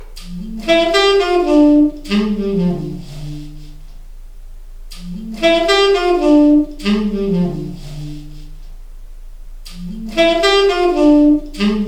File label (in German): sax ohne ams